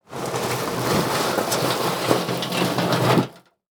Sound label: Household Trash Can Slide Through Leaf Debris